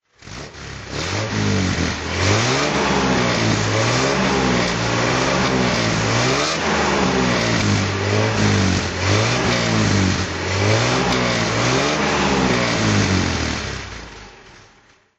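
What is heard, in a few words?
bentley r type throttle; Bentley R-type saloon, 1953; Engine sound; V3D/ ClassicarImages; Marc Vorgers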